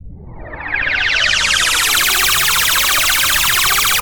Little Thrush; Zero-G; Soundclash Nu Dance Electro; Acidized Wavs; FX Atmospheres

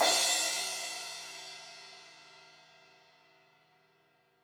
Crash 16in edge, Cymbals

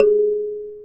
G#3 kalimba; WorldSounds

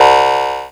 perc; Mono

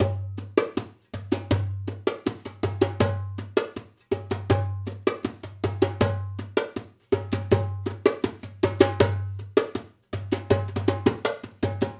Dumbek; Essential Percussion

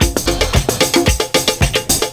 PERCS, 70 s Breakbeats

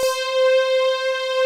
WARM PADS; Pro-Rec

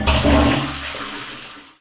BIG FLUSH

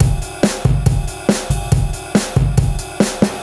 LoFi Beats, 140 BPM; Vengeance - Essential Clubsounds Vol.4

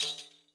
riq zil pp, hand drums; SoundBytes - Early Patches

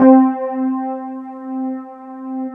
WET RD C3 R, Keyboards Old School; USB Soundscan vol.09; AKAI; WET ROAD

